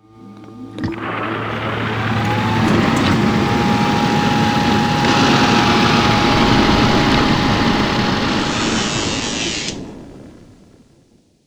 obstacle solar; The Swapper

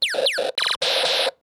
pet tank

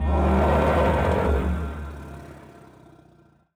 hurt elephant

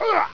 DEAD GUY; Lords of the Realm 2; DOS

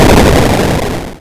boom

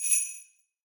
sleigh bell

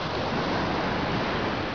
surf; Caesar 2; DOS

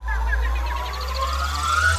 pillar charge long; battle

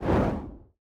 fire generic attack; CrossCode; battle

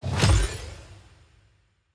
stamp scifi

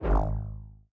throw ball wave; CrossCode; battle

